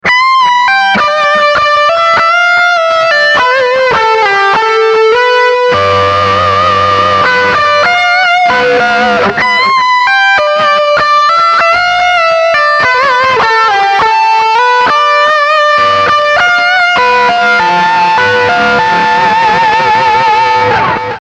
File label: Boogie Lead